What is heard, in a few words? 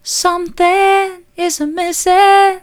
something is missing soft, vocal